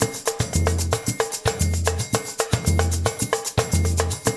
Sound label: BREEZE LOOP, Percussion Slam; InVision Interactive